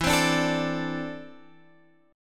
E 7th Suspended 4th Sharp 5th, Guitar, Half Step Down